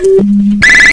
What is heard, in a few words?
FEEDBACK